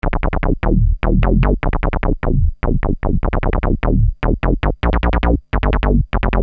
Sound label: Syn-Bass m Cm, Techno Synth Loops, 150bpm; Sonic Foundry (Sony Creative Software); Signals I